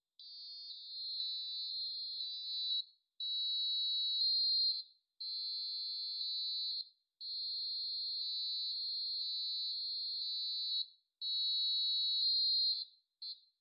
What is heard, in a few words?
buzzer march filtered